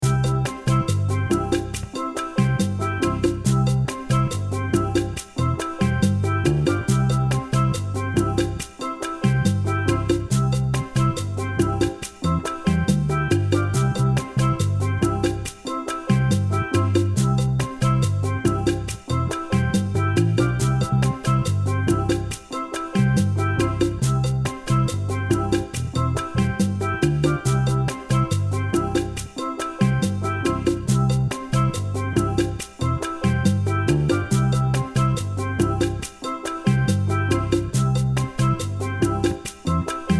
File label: one chord backing track (C); Calypso